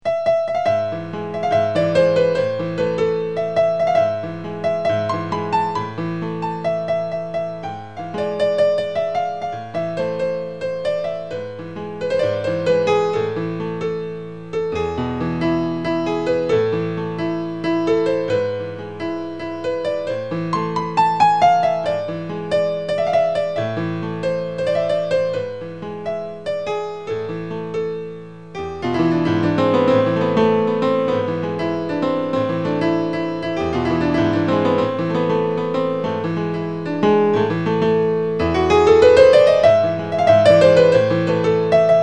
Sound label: minuet